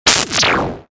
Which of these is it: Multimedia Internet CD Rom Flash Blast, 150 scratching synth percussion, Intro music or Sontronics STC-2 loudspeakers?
Multimedia Internet CD Rom Flash Blast